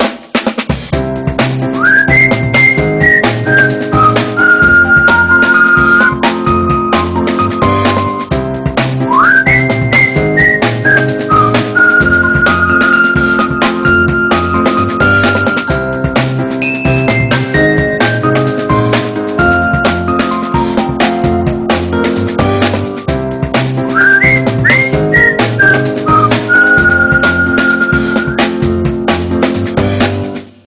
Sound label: standard videocall melody